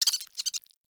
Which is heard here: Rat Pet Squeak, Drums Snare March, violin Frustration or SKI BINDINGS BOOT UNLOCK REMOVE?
Rat Pet Squeak